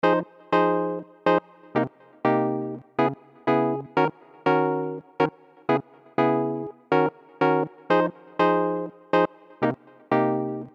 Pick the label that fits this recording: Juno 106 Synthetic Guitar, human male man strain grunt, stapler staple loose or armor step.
Juno 106 Synthetic Guitar